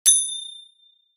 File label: Bell